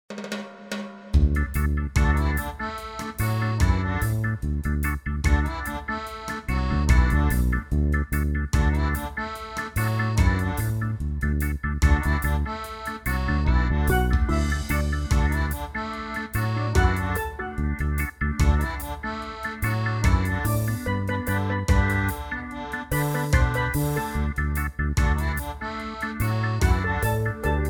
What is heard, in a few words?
no piano